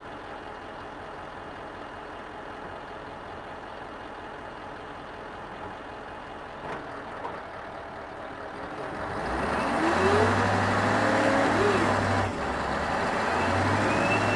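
Stridsfordon 90 onboard slow drive various engine intake; dpa4062; Sonniss GDC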